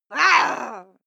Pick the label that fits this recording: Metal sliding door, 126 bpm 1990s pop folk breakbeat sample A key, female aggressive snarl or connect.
female aggressive snarl